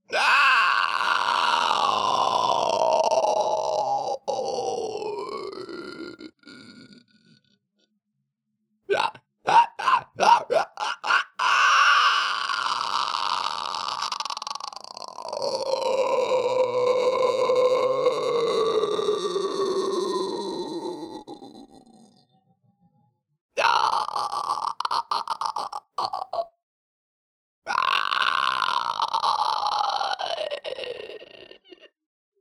creaker dying

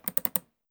Double Click Mouse Sfx

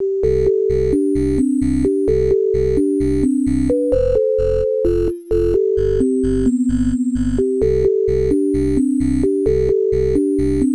Yippee MSX

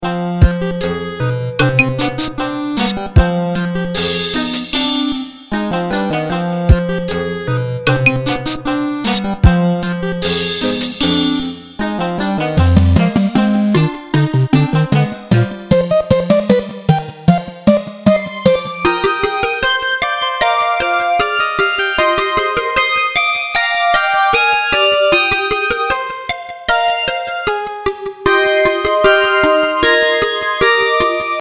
quirky magicville

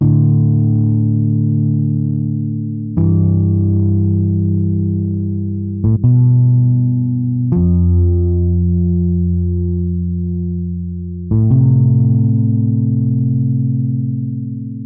Sad Song

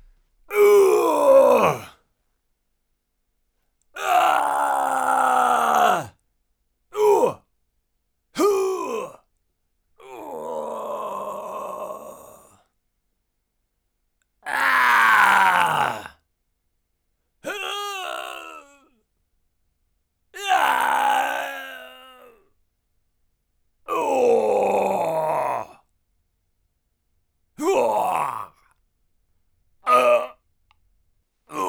death pain grunts

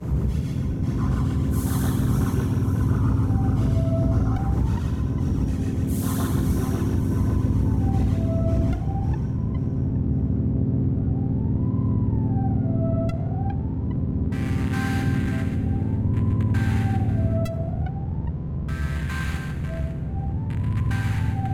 digital evening

